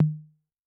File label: Ana Lo, Yamaha DTXpress-II Tom